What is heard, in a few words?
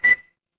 ui beep